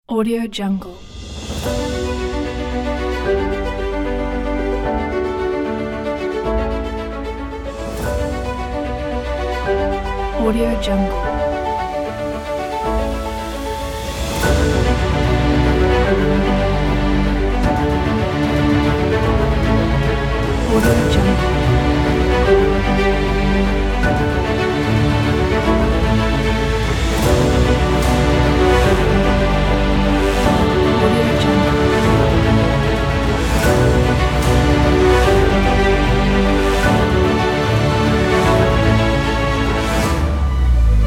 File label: inspiring epic; audioera